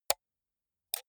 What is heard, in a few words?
computer mouse right click slow hard